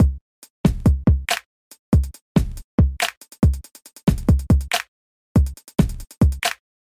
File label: Kung Fu Beat